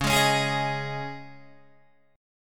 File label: Db Suspended 2nd Guitar DADGAD